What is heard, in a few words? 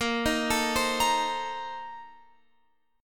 A sharp Major 7th Suspended 2nd Suspended 4th A sharp Guitar Open F; arpeggiated